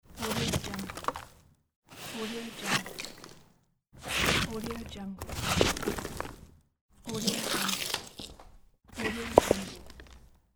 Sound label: cast debris aside; ikosonik